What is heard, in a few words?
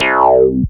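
sequence synth; AKAI S6000 CD-ROM - Volume 1; SAMPLE WAVE MIXING 2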